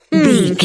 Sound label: voice female expression emote